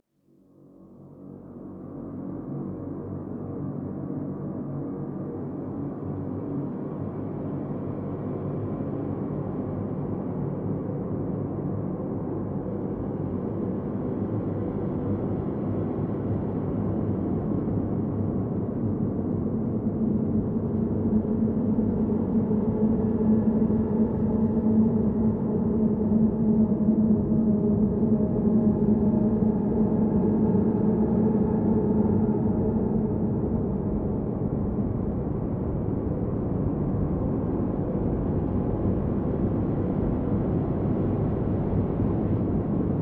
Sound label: music church pens ambient